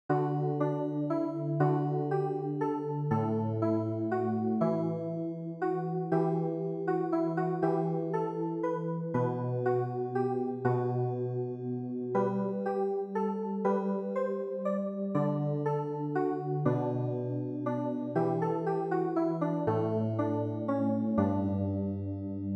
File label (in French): CLAVIER